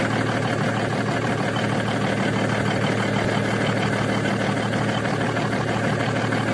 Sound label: 500rpm